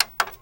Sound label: pc off